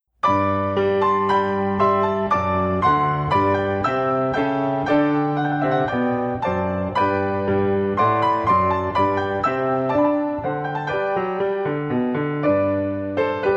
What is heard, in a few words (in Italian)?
Per la gloria d'adorarvi High accomp 1st verse